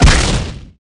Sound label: boom, battle